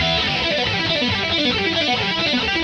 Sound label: E5 134 rhythm; Loops